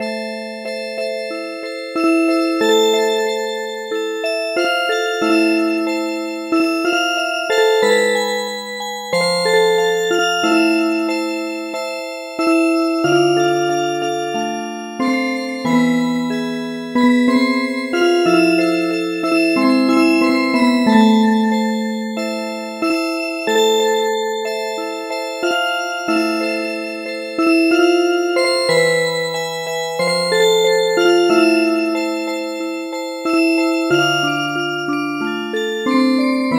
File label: Music Box; Bubuy bulan